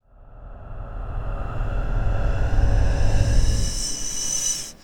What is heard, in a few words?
Ghost Breath, Strange Ambiences; Sonic Foundry (Sony Creative Software); The Electronic Music Manuscript Collection